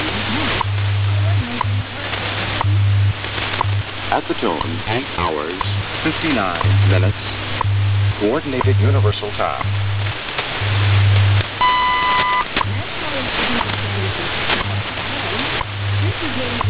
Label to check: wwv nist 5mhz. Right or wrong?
Right